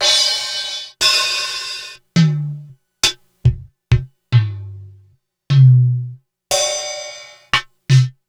Korg DSS-1

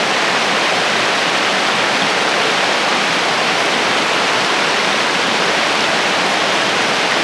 rapids, rivers; Zero-G Ambient 1

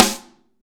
SNARES DRY; Real Mega Drums

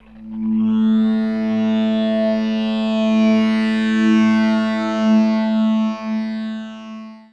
Guitar Textures

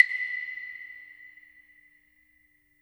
CLAVE WET; InVision Interactive; Percussion Slam

